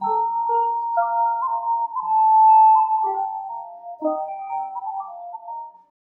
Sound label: keys, jazz loops